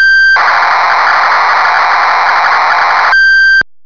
Korg Polysix factory presets bank a, Cassette Interface; Synthesizer Factory Patches